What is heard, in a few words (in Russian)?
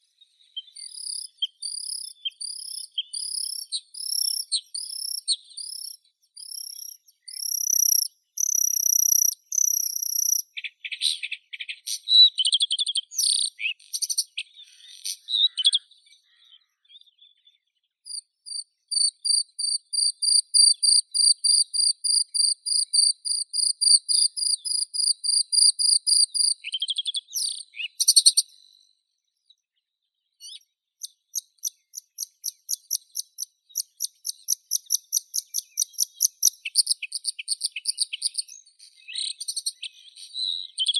varakushka luscinia svecica, zvuki prirodi